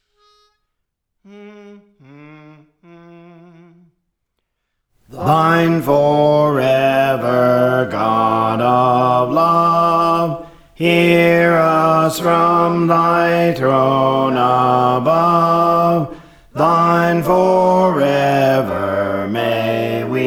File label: Bass; Thine Forever; Praise For The Lord Training